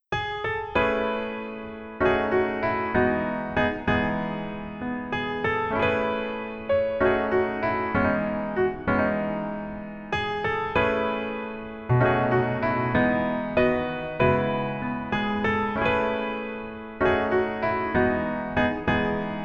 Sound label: Piano ver